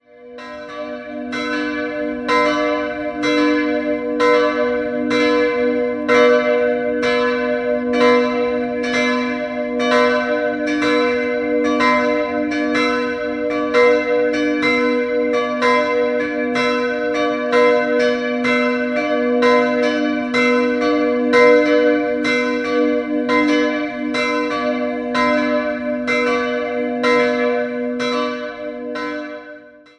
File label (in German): Holzharlanden, Glocken